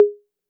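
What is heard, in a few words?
Roland808 high conga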